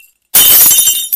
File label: Glass Break